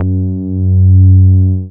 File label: BASS MINIMOO